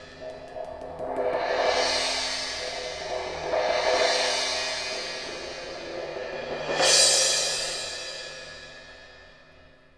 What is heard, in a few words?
CYM ROLL, AMB SOUNDS